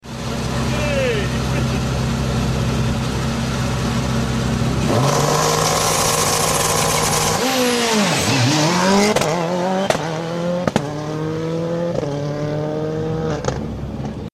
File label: Rally Start; Sti